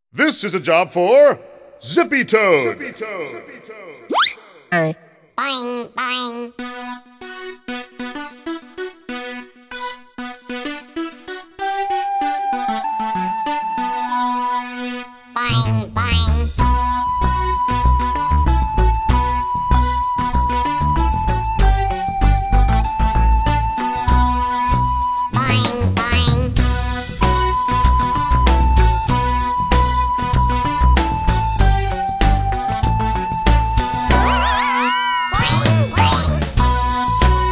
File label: Zippy Toad full slow